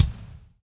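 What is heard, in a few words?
solid floor tom, verby kit; Spectrasonics; Burning Grooves CD2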